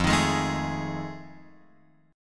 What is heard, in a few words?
F 7th Sharp 9th, Guitar, Open F; F7#9 strummed